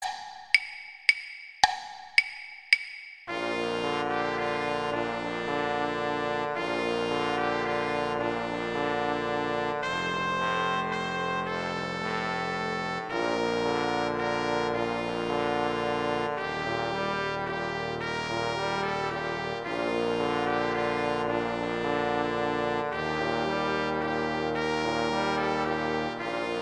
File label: Trumpet II in Bb; Brass; Gruber; Silent Night